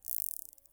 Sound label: sonar; NIPS4B